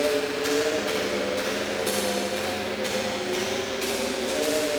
Cracklature, sparse soundscape

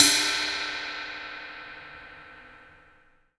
CYM HIT; Sounds Good - Roots Reggae

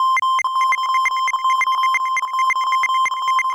Kaossilator gated (130BPM); musicradar

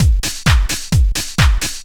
straight, Techno Trance Essentials drum loops

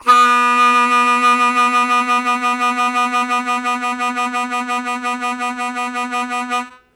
Strong Vib Sustain B0, Harmonica; Zero G

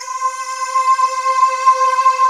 H.VOX C 4, DREAMY VOICE; AKAI S-Series CD-ROM Sound Library VOL-7

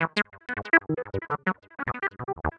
Heavy Industry Syn Funky, Keyboards

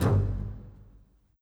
Bass, Orchestral; Sonic Foundry (Sony Creative Software); Orchestral VOL-2; Modern 09

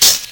CABASSA, Ragga & Dub Reggae; USB Soundscan vol.27; AKAI; CABASSAS

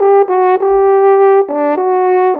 Horn, Orchestral, Rock Pop; Sonic Foundry (Sony Creative Software); Orchestral Series VOL-4 - Rock and Pop

